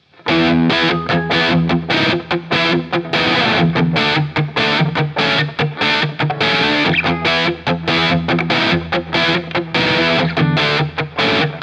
Tropical Fuzz Honey Alle Regler in Mittelstellung; Beetronics Abelha

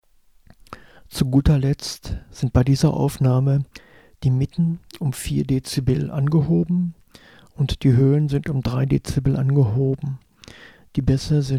Akustik Duo; selbst abmischen

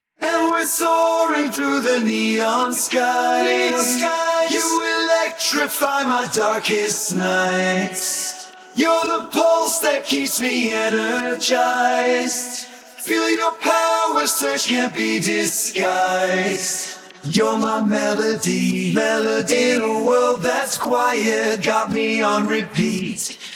gesang

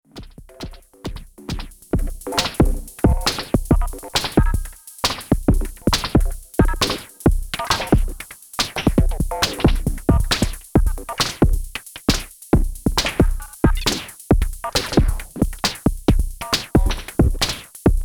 Soma Pulsar 23 Patch Looper quantisieren CV Generator

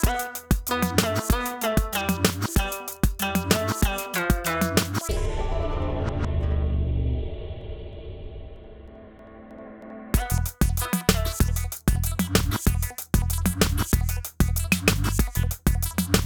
Kompressor Vergleich SSL Native Comp V6

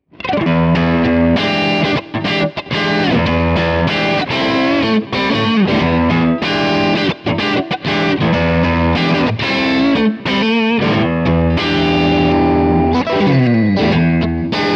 Fender Gold Foil Jazzmaster Crunch mehrere Pickups und Kombis

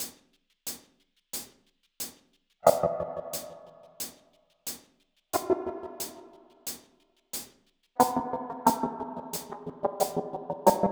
Rhythmen mit Delay 90 BPM Guitar Rig 7; SW Blue

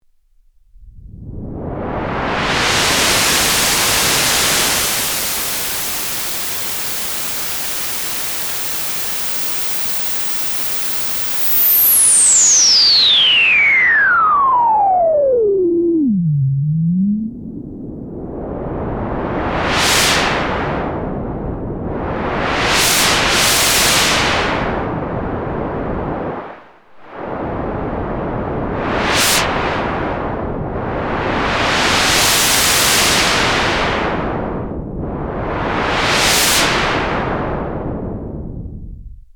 gefiltertes Rauschen; Model15

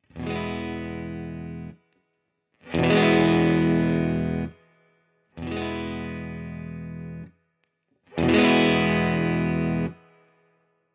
Carl Martin Tone Tweaker Boost 15 Uhr

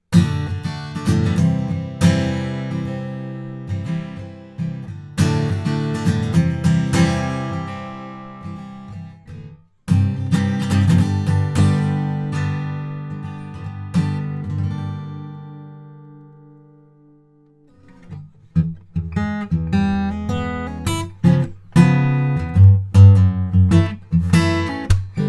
Warm Audio WA-19 Akustikgitarre t.bone SC400

